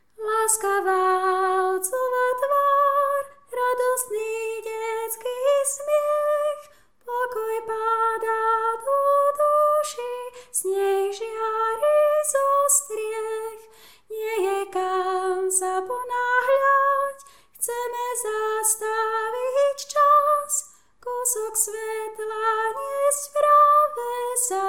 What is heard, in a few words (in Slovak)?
tenor